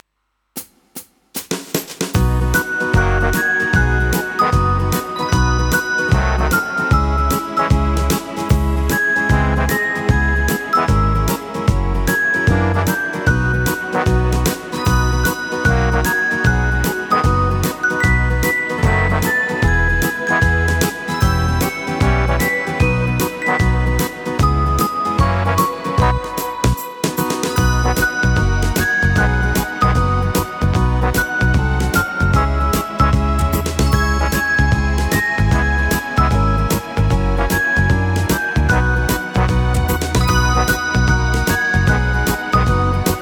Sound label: Instrumental